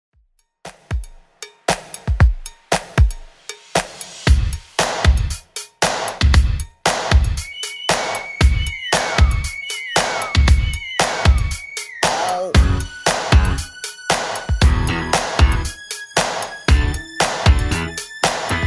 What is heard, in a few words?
80s Dance 116bpm; Redrum